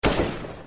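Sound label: AK47 attack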